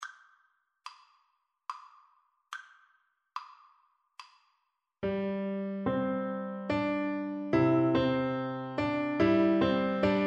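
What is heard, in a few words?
xylophone, Percussion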